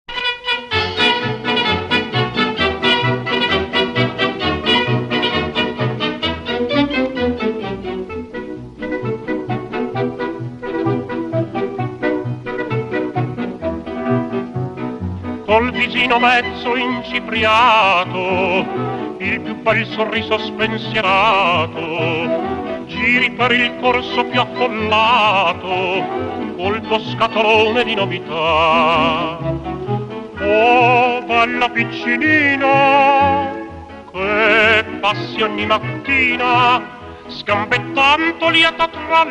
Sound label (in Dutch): Jodel